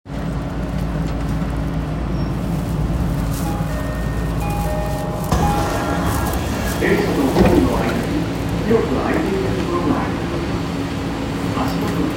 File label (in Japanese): 銀座線新橋東, train sounds